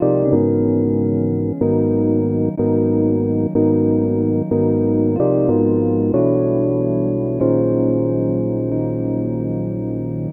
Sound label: Melody, Hip-Hop